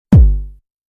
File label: Shortest Techno